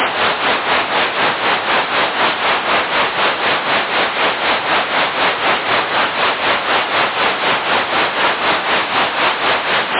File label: alarm clock sound effect; Generated alarm clock sound effect 10 seconds duration